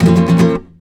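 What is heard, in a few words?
RASGAND B minor, FLAMENCO Guitars; Spectrasonics; Hans Zimmer Guitars VOL-2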